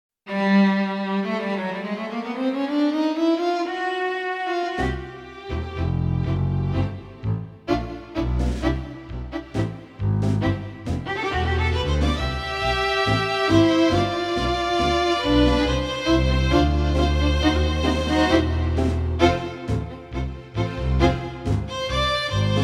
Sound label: Orchestrale, roland v accordion